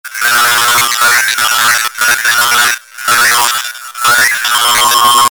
code agressive distorted

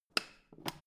foot switch turn on off soft

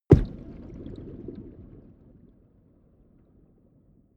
underwater short

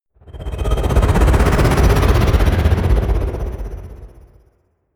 whoosh flutter

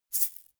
shaker plastic egg hit double hard

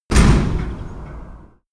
banging garage door

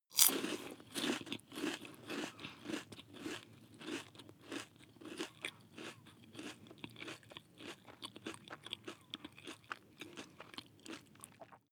chew biscuit one piece

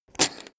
poker chip stack big fall down

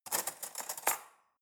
cutlery fork drawer handle

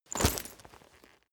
leather metal impact soft

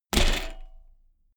bmx bike land impact rattle

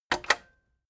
cassette eject click fast heavy

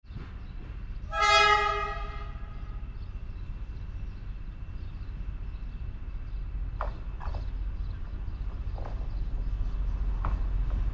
train passing with hooter

